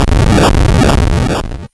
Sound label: Game Boy FX Noise, chiptune; Nintendo Game Boy